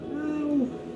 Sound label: auuuuu